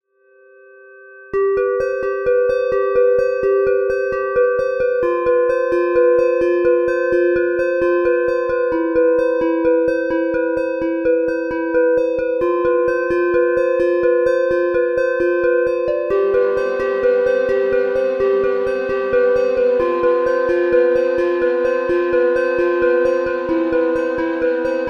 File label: harp pad no env harmonics